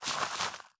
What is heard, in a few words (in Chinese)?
堆肥桶堆肥完成声